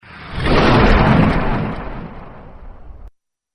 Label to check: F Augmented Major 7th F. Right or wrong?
Wrong